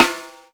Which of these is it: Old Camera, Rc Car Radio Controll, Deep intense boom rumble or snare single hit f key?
snare single hit f key